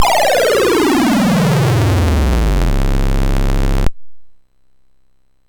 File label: Dragonworld sid MOS6581R4